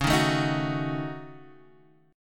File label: Gb Minor 6th Db